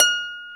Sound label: harp